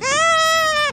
kill, endermite; mob; Minecraft; Votive World

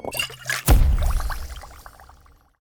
brewing stand brew; Minecraft; Votive World

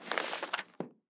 book close put; item; Minecraft; Votive World

